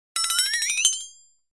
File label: Bouncing Twang ( Metal ); Ascending Bouncing Multi-pitched Metallic Twangs.